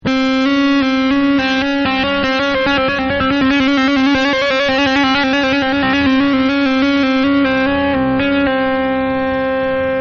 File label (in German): triller